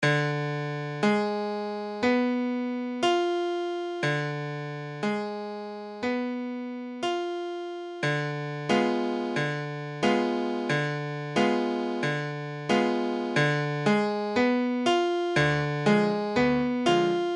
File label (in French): Ddim7, guitare